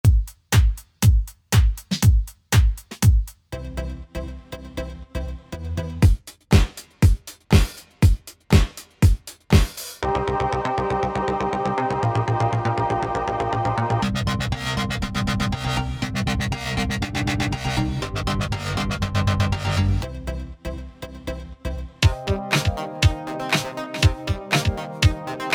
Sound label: UP BEAT band